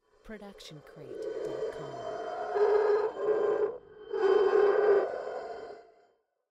Distorted Haunting Voices; soundscrate

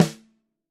snare; soundscrate

